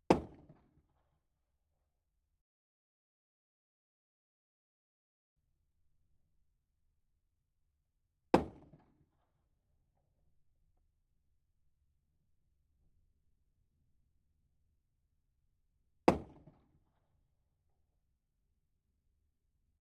TT33, Firing, Inside Car, Tokarev TT-33 semi-automatic pistol; Omni; Pole Position